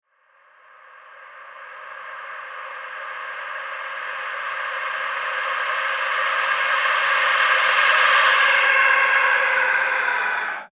Horror Scream Processed Effect Male; Screaming; Voice

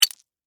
Icicle Drop Hit, Ground, Small; Weather; Snow Sound Effects; BlastWave

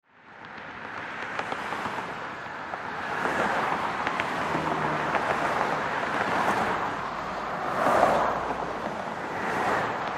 Traffic Expressway Drive By Left To Right Close; Transportation Automobile; Traffic And Car

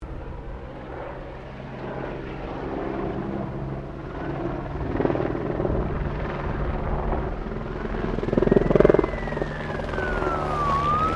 helicopter Bell 204 firefighter bombing run close; Helicopters; Aircraft Collection

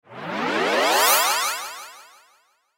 Cartoon Ascend Spacey; Cartoon Music; Cartoons; Blastwave

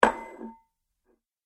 Dessert Bowl Placed Then Slides On Table; Dishware Sound Effects; Household Sound Effect

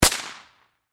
Explosion Firecracker Single Close; Firework Sound Effects; Fireworks (Sound Effects:Backgrounds)

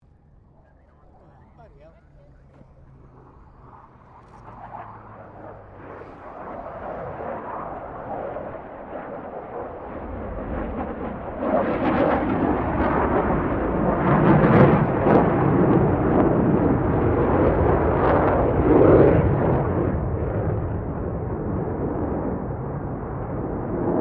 AIRCRAFT FA-18 HORNET PASS DISTANT; RAAF FA-18 Hornet; Airplanes; Vehicles

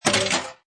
Pinball Sound Chunky Rattling; Video Games

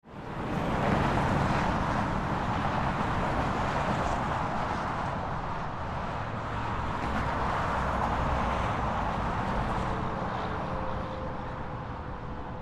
Traffic Highway Near Exit Ramp; Traffic And Car; Ambience; Traffic and Cars; Blastwave

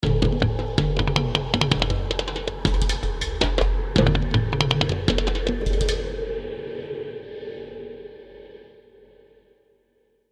Tribal Drum Rhythm, Tribal Drum Rhythm Eerie; Composition; Blastwave

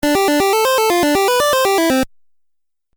Musical Phrase Video Game

